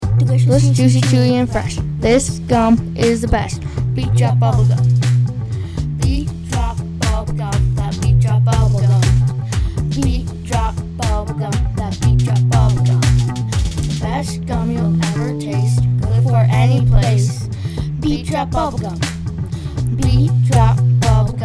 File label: Beat Drop Bubblegum; Frontier Middle School